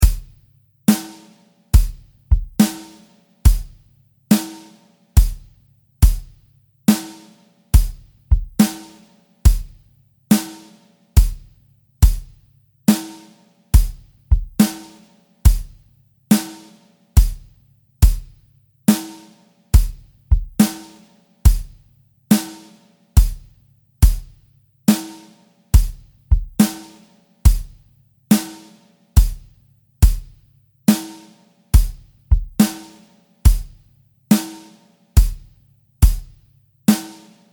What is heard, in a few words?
7 4 Rock Groove